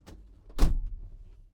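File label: volvo pv544 sport onboard door close interior; Volvo PV544 Sport 1965; DPA4021; Pole Position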